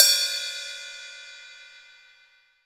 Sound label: Hat open bell; Akai MPC500; Hats TKit